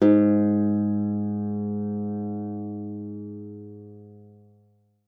G#2, GUITAR; Xsample VOL-2

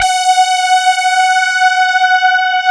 CMI PIANO; Wizoo; Powered DX

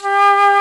FLT FLUTE-AB, Orchestral Winds; Roland LCDP04; 90 s Sample CDs; C Flutes 3-8; C Flt Sh 6&7

